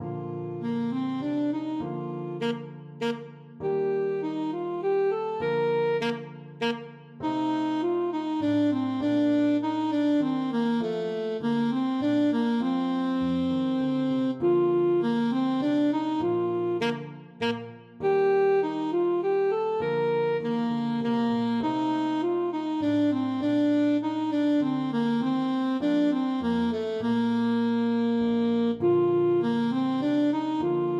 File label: bach minuet, saxophone